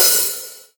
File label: HiHat Open